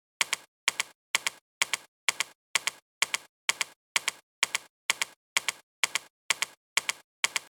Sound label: Techno beat with field recordings tree clicks